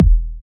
kick

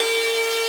synth main

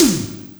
electronic snare

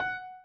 piano

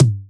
tom mid low

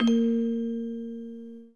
orgel

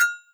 cowbell high pass

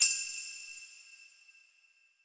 soft slider tick; osu

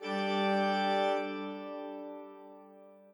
chord

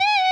Bend full Release

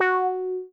meow

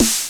normal hit whistle; osu

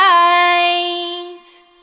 vocal

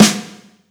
drum hit clap

